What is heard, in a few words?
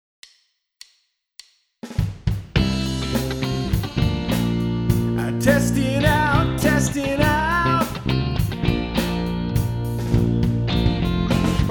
Vocals; Focusrite